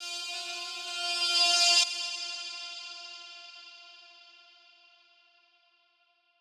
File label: Sax Tn Loop 130, Loungin House; Equipped Music